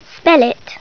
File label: SPELL